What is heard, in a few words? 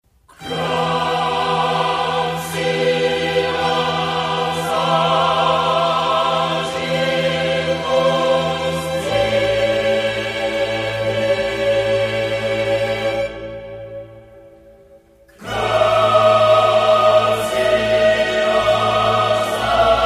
Choir, Gloria, Gratias agimus; Vivaldi